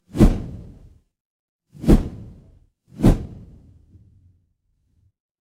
fire electric whoosh flame

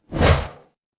ui swipe

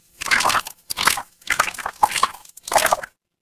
water swirl

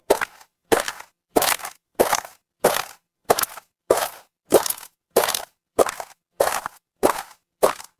footsteps gravel

